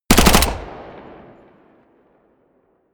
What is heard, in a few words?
smg uzi 45 close burst